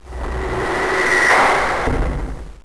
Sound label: laser charge